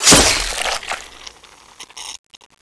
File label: impaled; de forsaken